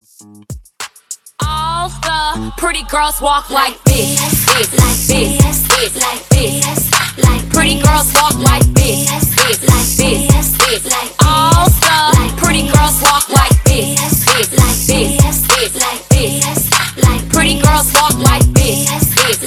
Hype Extended Intro Outro 98 BPM

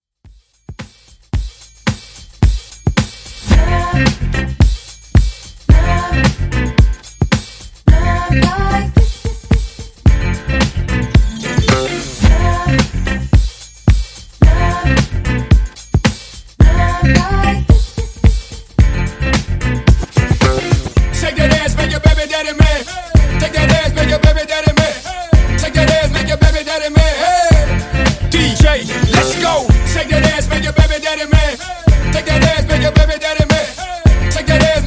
funk bootleg mashup 110 bpm

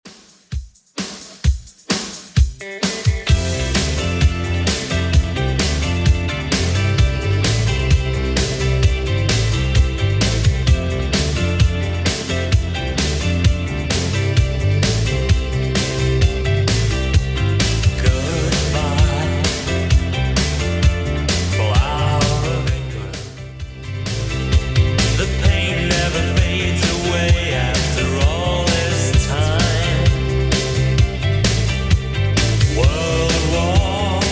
80s Redrum 130bpm

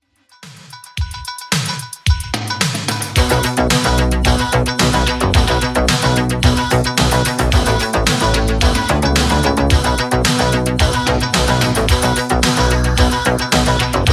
80s Redrum 110bpm

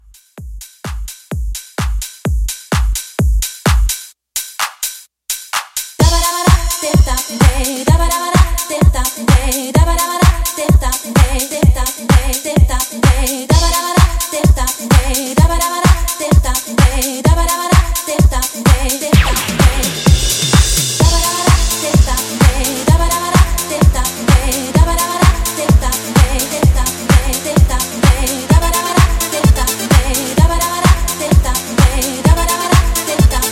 90s Pop Re-Drum 128

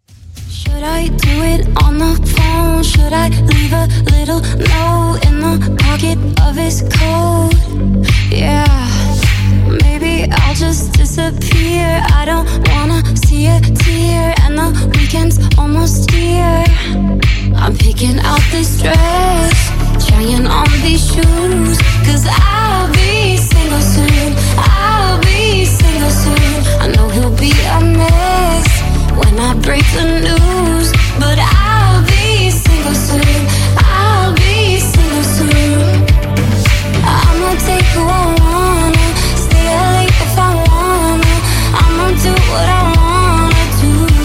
Dj Intro Outro 105 Bpm

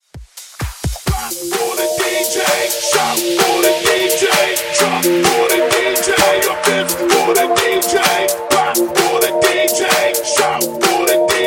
Hype 90s Pop

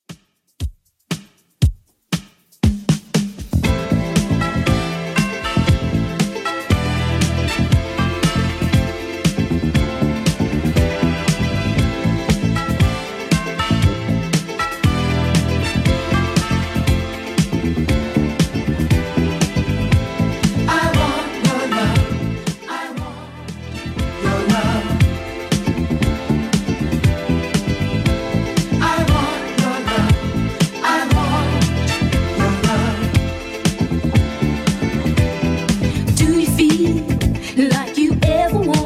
70s Redrum 118bpm

